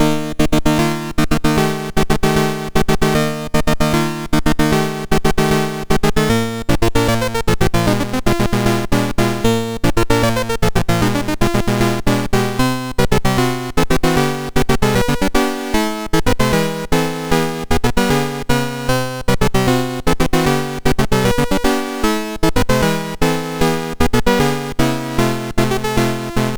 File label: Fanfare, sid MOS6581R2